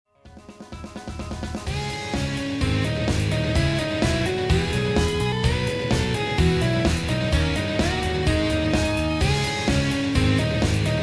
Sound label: minusone